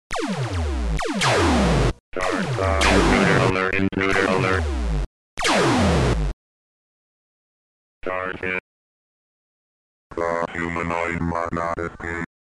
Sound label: gun